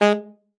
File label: G#3, ALTOSAX; Xsample VOL-2